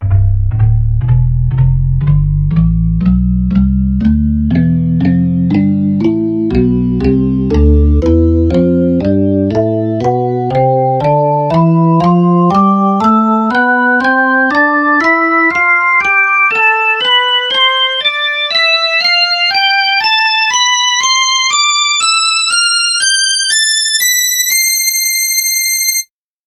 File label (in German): percussive organ tonleiter